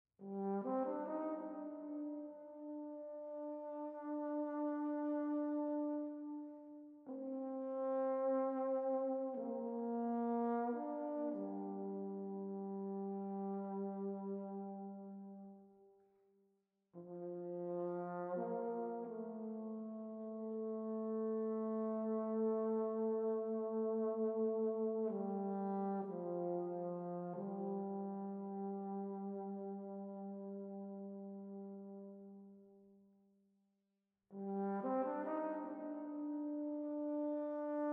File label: ambient music